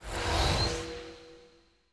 Trono di Cristallo; BLIND QUEST - The Enchanted Castle

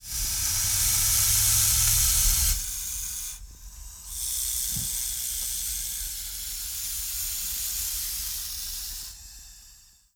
serpente; Inquisitor 2

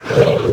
Lupo; BLIND QUEST - The Enchanted Castle

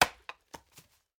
Falegname; BLIND QUEST - The Enchanted Castle

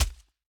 Muro; BLIND QUEST - The Enchanted Castle

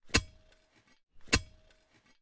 Scavafosse; BLIND QUEST - The Enchanted Castle

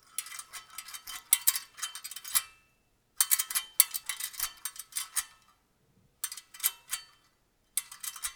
rumori catene; Inquisitor 2

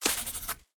Runa nell albero; BLIND QUEST - The Enchanted Castle

